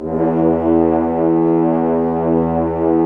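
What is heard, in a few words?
French Horns f, Dry; Roland LCDP06 Brass Sections; F.Horns 2 f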